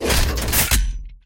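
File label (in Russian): metallic sharp loud reload sound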